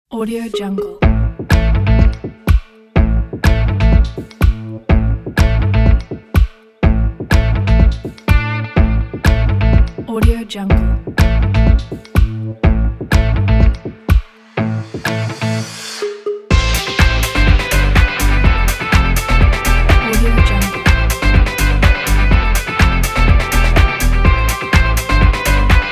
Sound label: pop rock; 22stars